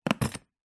industrial toolbox heavy duty plastic metal latch open; zapsplat